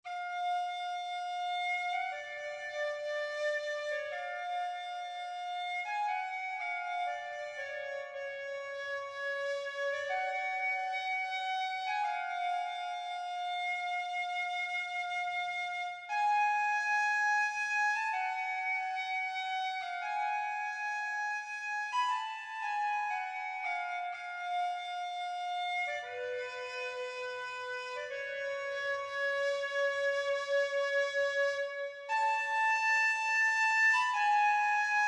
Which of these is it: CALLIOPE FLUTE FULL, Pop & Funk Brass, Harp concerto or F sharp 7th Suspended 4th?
CALLIOPE FLUTE FULL